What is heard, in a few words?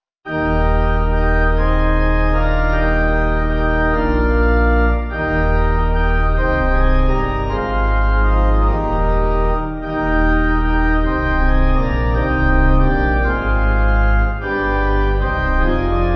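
Pipe organ, church music backing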